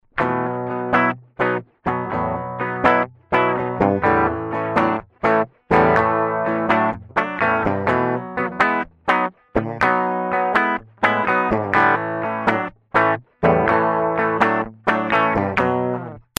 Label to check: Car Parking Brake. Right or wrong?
Wrong